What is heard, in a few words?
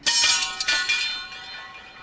sword drop